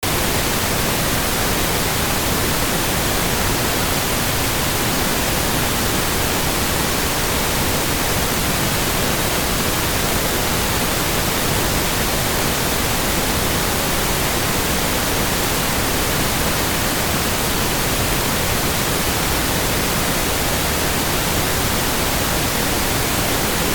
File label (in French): bruit rose